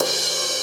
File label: Crash medium